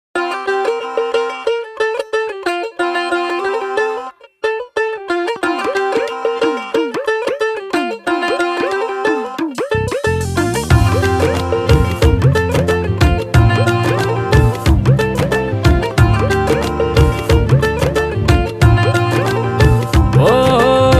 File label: Jingle Bells